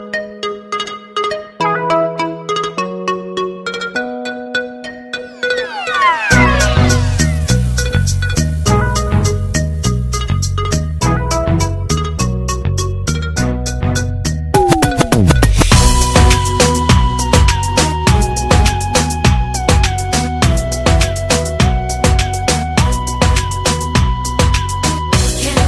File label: Musical